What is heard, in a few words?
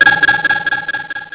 SONAR